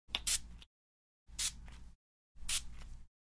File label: Air freshener sprays